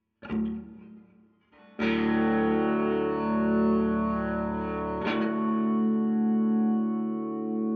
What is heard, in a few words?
GTR Royer